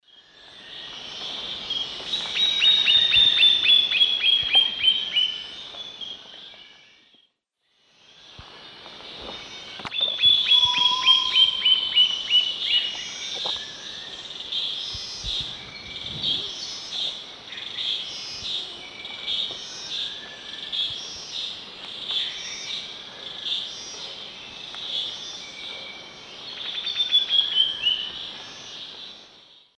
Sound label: Sclerurus caudacutus, song; S. America; Eastern Lowlands